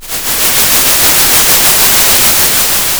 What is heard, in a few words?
Sound of a thunderstorm